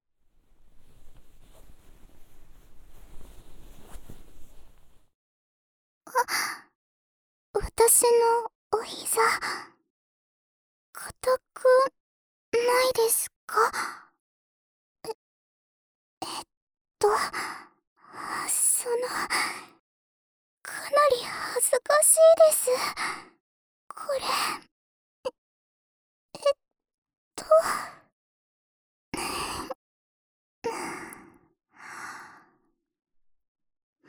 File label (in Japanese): 膝枕で耳かき（右耳）, ASMR